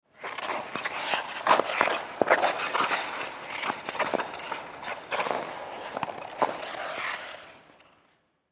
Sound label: Ice Skate Foot Work, Ice Skate Foot Work Rink; Ice Skating; Sports; SoundEffects-Blastwave